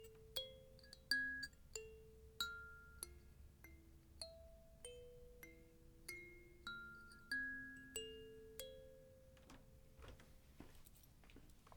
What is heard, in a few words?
sensa